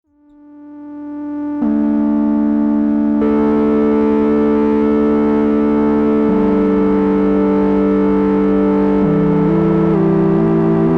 Motor Synth MkII Latch Drone; Gamechanger Audio

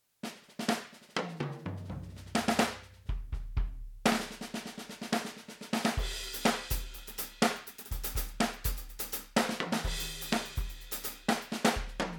ALESIS Strata Prime acrylic raw kit

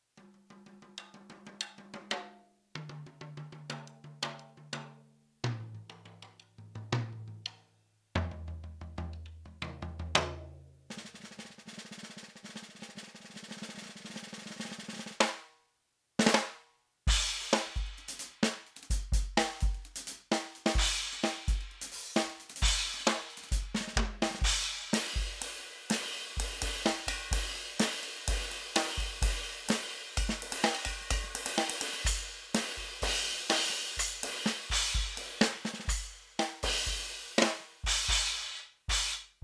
ALESIS Strata Prime tight beech kit

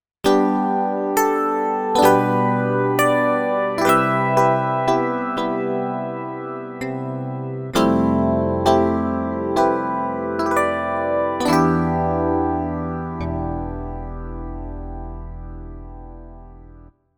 Casio PX-S5000 E-Piano

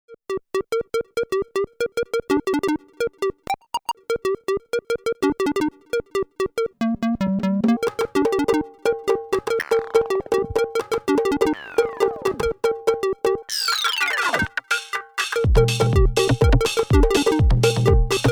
Roland MC-101

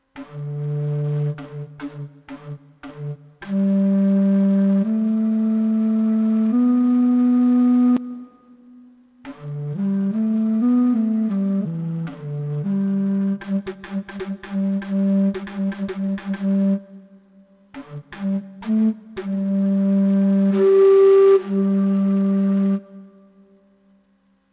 Moseno Flute; Artinoise Recorder

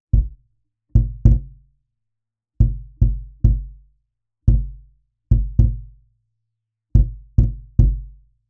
Cajon neutral

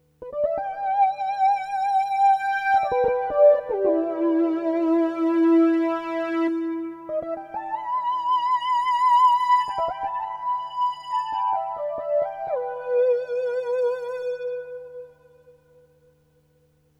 Lead Synth; Studiologic Numa X SE

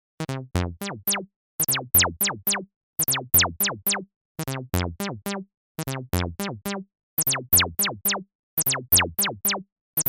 TD-3 Accent Sweep

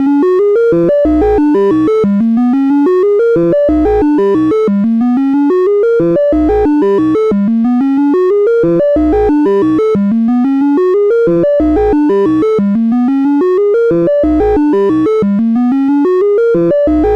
Strong Synch atypical use; Behringer System 35

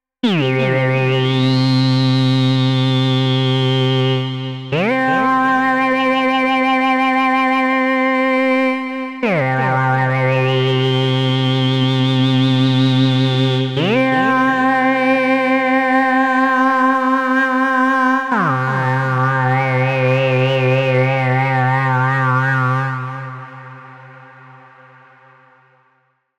GForce ImpOSCar3 Vocaloid Lead

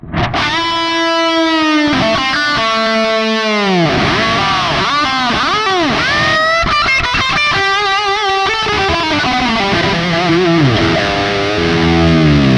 bridge neck lead; Sire Larry Carlton S3

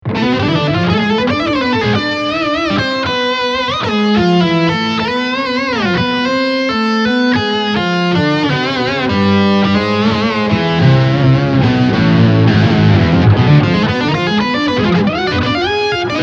Distortion + Octaver

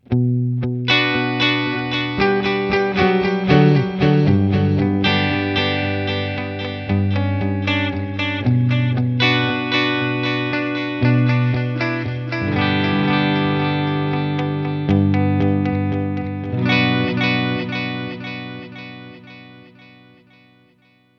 clean SDE3000D Delay Plate Reverb; Boss Katana Artist

